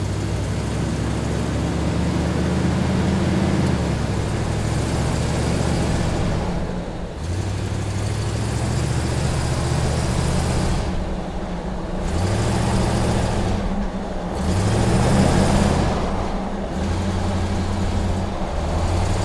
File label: vehicle pickup drive